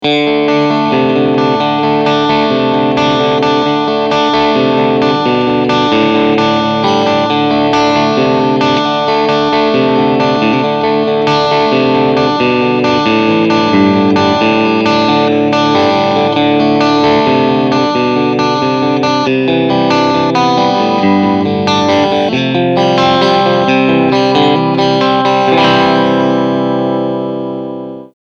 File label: Position 2 Crunch